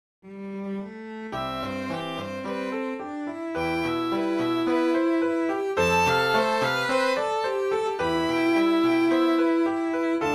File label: Piano Trio CELLO